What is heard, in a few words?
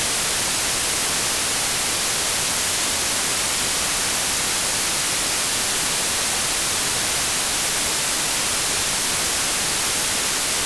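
noise white gauss right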